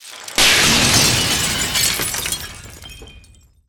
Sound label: speaker glass breaking